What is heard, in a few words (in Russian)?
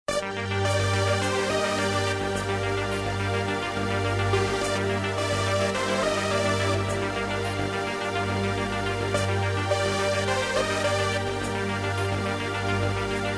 tatarskaya melodiya